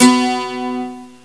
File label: shamisen like; jazz jackrabbit 2; M8